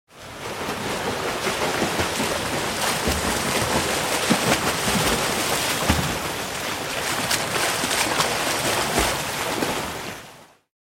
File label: Olympic swimming pool swimming race